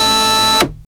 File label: 3d printer complete; 3d printing